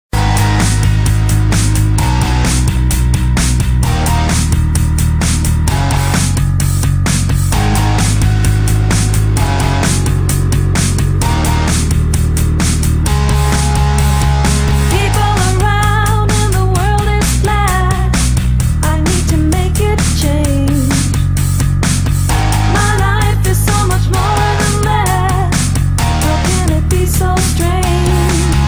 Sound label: Ringtone; Sony Xperia